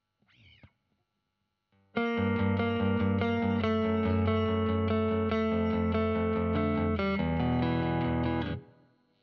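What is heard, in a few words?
Guitar Royer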